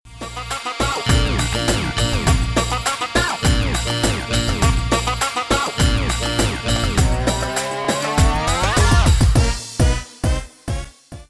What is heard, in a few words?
mahrgan new